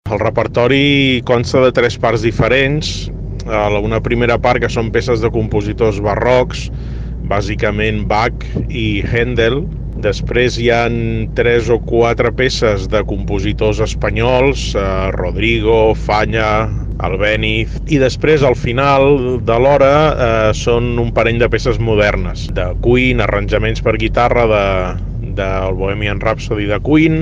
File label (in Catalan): guitarra; nova radio lloret